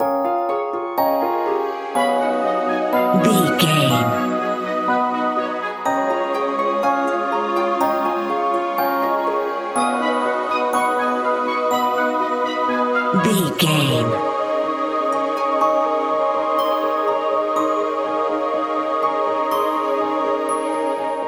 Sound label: enchanted magical forest